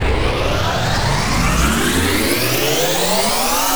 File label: sfx sci fi; Masterbits - Switch FX